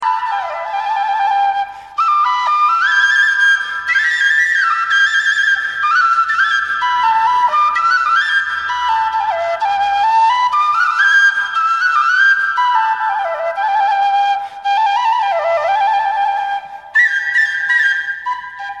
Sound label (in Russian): Kuraj tatarskij nacionalnyj duhovoj, Tatarskaya narodnaya melodiya; Sarman